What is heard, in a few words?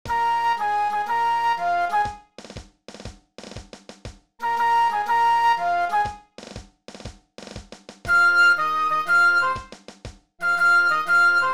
flute